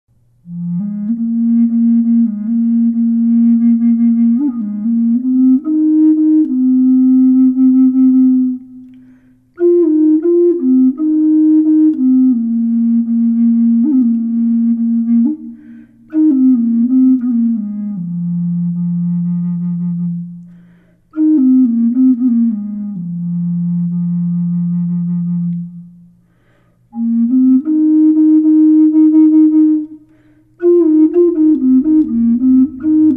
bass f flute reverb

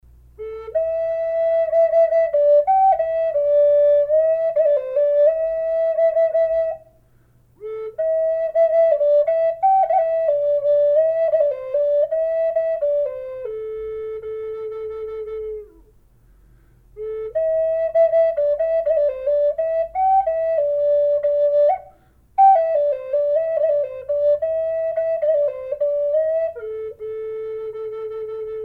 kauri A minor, flutes; collector flutes

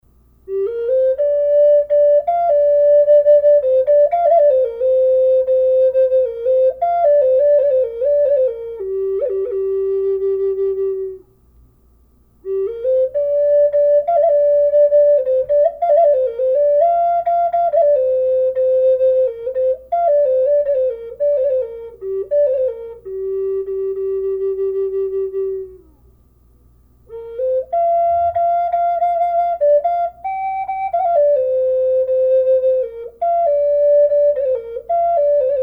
g minor, flute; mourning dove; collector flutes

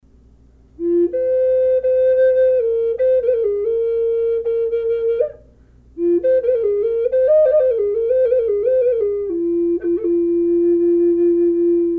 barn owl burl f minor, flutes; collector flutes

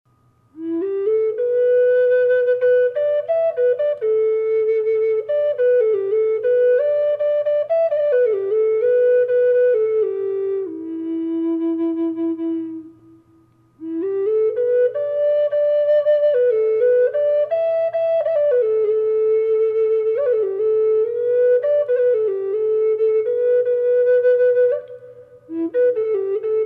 arctic wolf reverb, flutes

